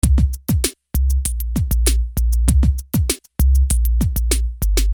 Beat, Hip-Hop Street Beats

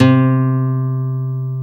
NOTE, Acoustic; Sound & Vision; Gigapack I CD 2; GUI ACOUST.; GUI Acoustic 1

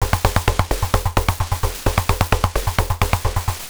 Beat, Industrial; Sonic Foundry (Sony Creative Software)

